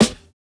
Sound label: Snare Hit; Hip Hop Exotica; Big Fish Audio